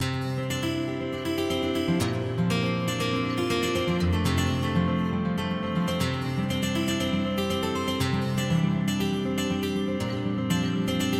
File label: Room reverb